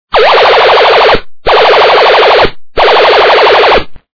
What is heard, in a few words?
machine gun